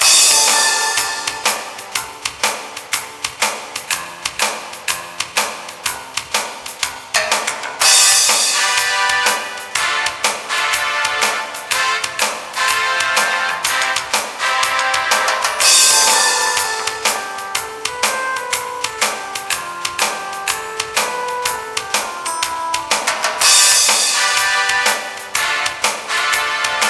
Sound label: Bowling Tune; Spider-Man The Movie